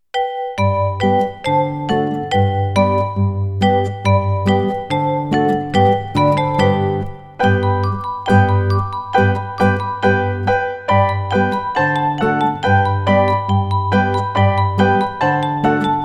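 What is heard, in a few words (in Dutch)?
dikke bromvlieg zonder zang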